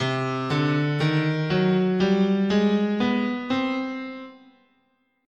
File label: C Hungarian Gypsy, scale